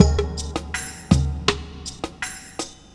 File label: breaks 162; minihalfs